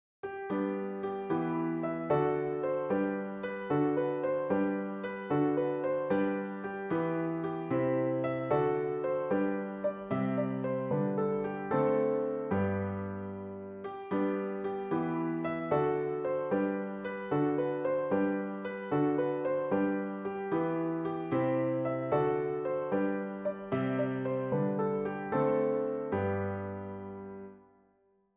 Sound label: i saw three ships tune keyboard; christmas music and carols